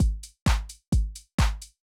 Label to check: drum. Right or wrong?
Right